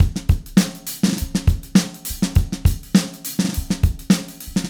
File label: Acoustic Kit, Drums & Percussion